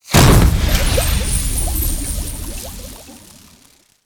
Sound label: Magic Harvest; Levels Hub Swamp 02 Cursor Potion